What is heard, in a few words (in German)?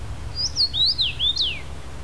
karmin